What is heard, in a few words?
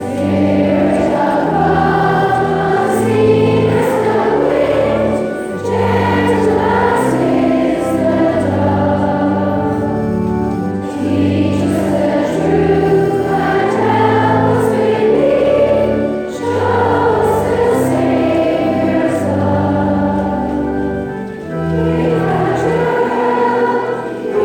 Childrens Choral; Ferns Childrens Choral Festival